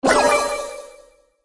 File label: ghost